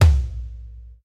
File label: roomy tom one shot c sharp key